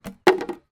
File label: leisure draughts checkers outdoors large plastic piece down drop